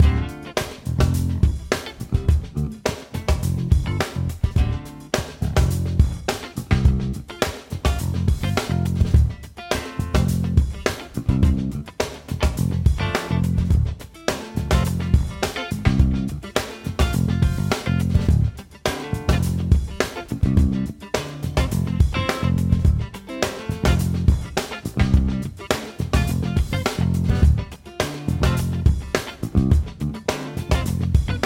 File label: solo loop